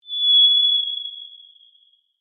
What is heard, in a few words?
Bird Whistle Bird Call, Australian birds